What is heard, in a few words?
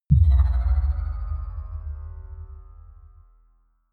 sci fi bell bass